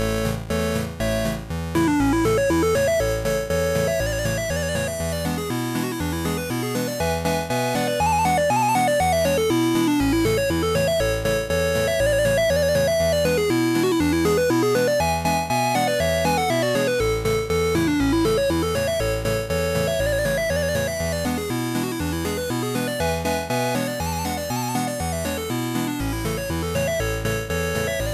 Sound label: Polka; creative music system; ibm pc xt at